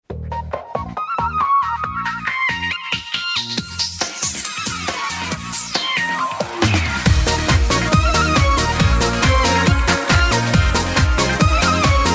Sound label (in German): Gesang